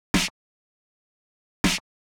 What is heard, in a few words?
Snare; hydrogen